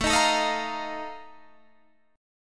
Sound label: F 11th A, chord